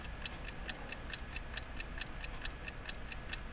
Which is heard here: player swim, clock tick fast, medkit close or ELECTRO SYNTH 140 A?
clock tick fast